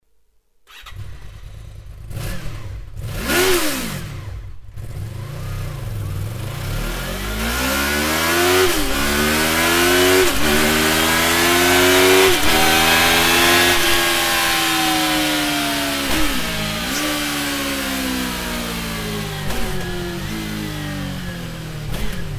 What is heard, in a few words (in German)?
Akrapovic Slip-On Line (Titanium) Auspuff für Honda VFR800X Crossrunner